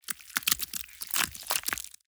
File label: Drop Bodyparts, Just Gore; SoundBits